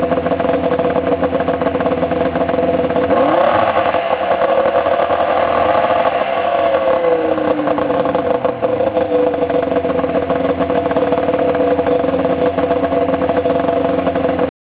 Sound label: 700 bored silencer, snowmobiles; aaTECH